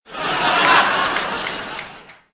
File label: quick laugh